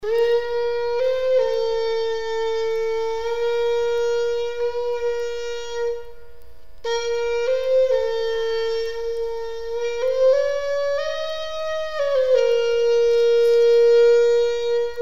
bukkehorn

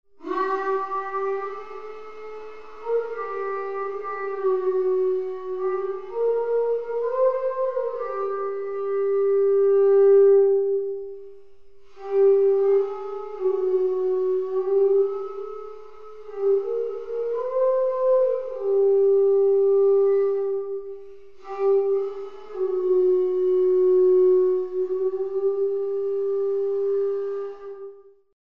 small prillar horn, Blowing Horns

